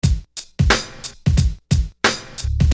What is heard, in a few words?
Beat, Hip-Hop Street Beats